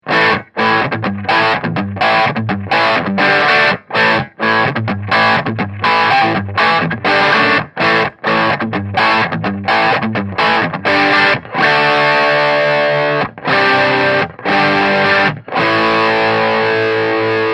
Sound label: Bias Distortion Overdrive